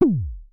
Synare Filter; Star Instruments Synare 3

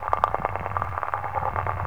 Crackle Machine, Environments; Sonic Foundry (Sony Creative Software); Myths of Technology

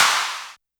Noiseburst, YAMAHA RM1X

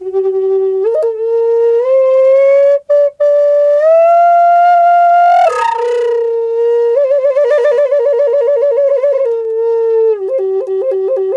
G WILD, FLUTE WILD

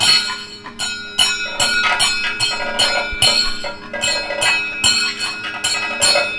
KATAK, Indian Traditions; USB Soundscan vol.45 - Indian Traditions; AKAI

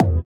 sitar, Indiatek; Spectrasonics; Metamorphosis

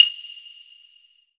PERC, Hip-Hop & R&B; USB Soundscan vol.47; AKAI; HIHHOP

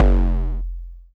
That 808 Bass

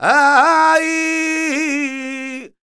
voc c, Flamenco Vocals Male Cantaor

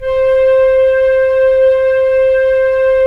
FLT FL, Flute; Roland - LA Composer vol. 4

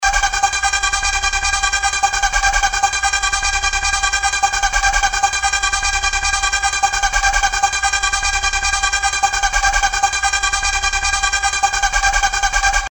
Scratching, Musical FX; Industrial

